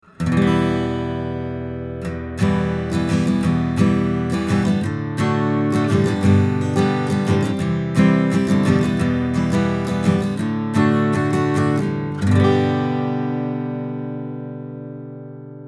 Schoeps CMC6 MK4 Mono AGuitar close 20cm

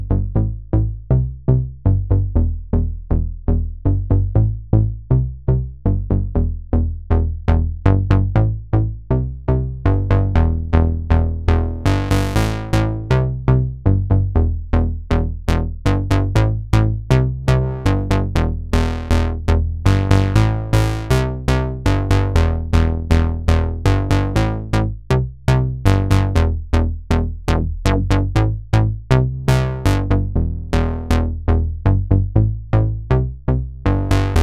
Bass

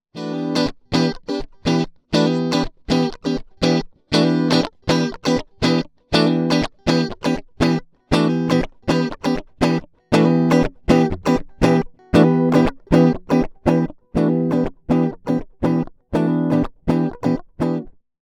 Strat passive pure Z Tone Sweep; IK Multimedia Axe I/O Solo

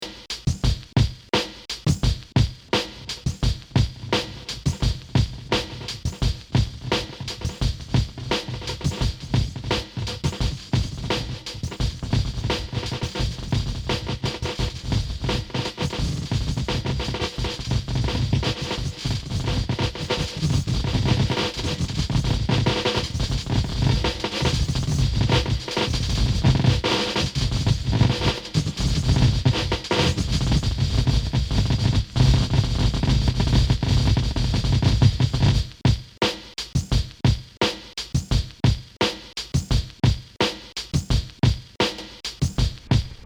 Akai MPC 2.10 Granular

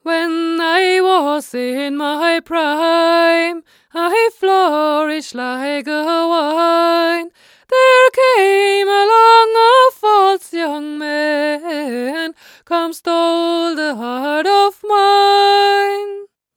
De Esser; Toneboosters

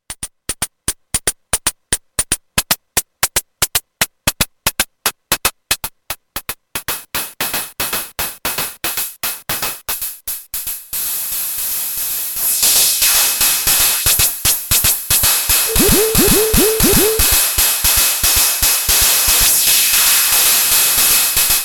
High Freq Sounds; PlasmaVoice

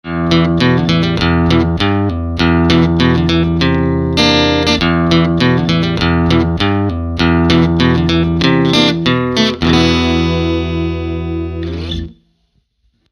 Walden T550 more Middle

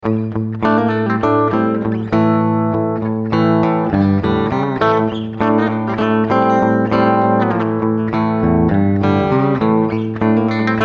humbucker clean

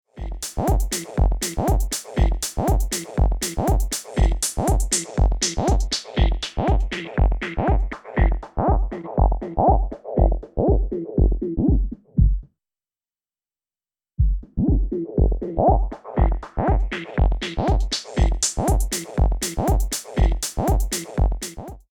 Reloop KUT Low Pass Filter

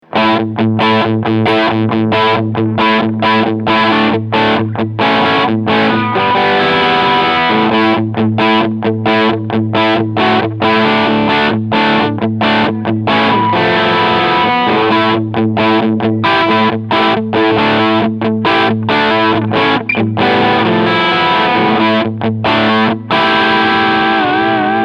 MXR Dookie Drive Blend Left Scoop OFF

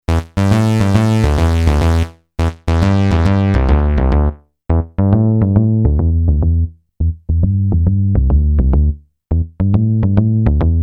Peavey Spectrum Analog Filter Saw Bass

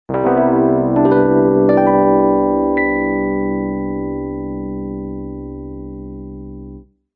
E Piano; Magix Acid Pro 10

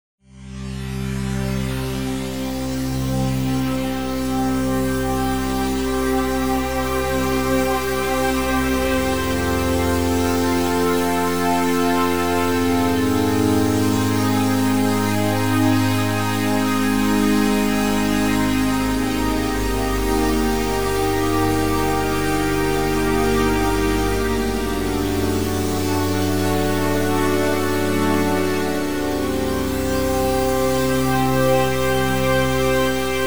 Highbright PAD

